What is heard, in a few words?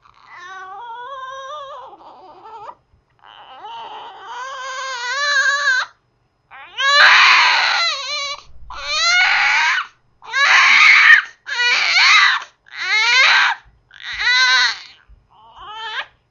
scream